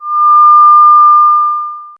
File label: a calm notification sound; alerts